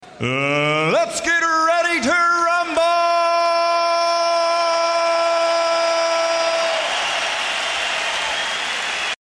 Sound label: Boxing Intro Sms